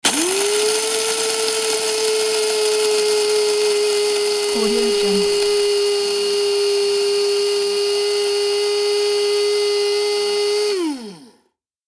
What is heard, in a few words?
coffee grinder grinding coffee beans